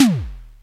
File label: TOM, Simmons SD-8 Drums